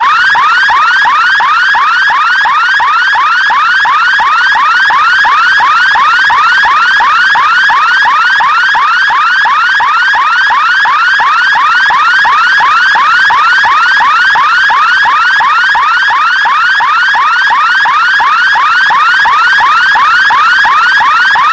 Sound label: police piercer siren